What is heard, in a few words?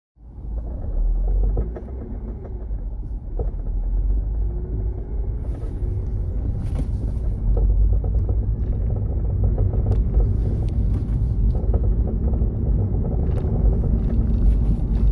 mazda 3 clunking noise under acceleration